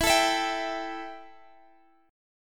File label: E Minor Double Flat 5th E